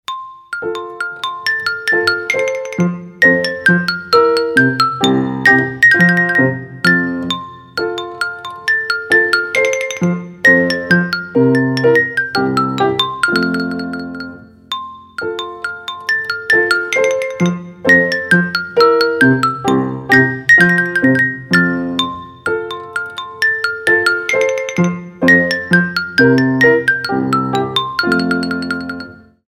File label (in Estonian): ksülofon